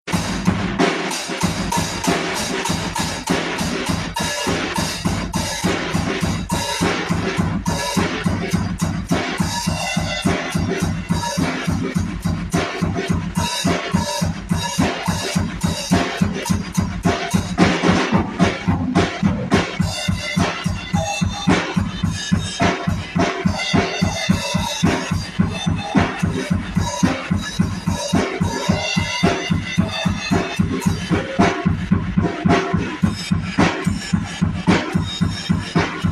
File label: drums